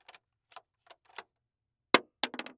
Weapon Bullet Shell 270 Rifle Eject Shells On Wood Floor; AdobeCC